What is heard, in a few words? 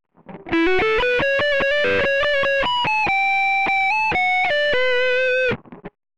fxbox solo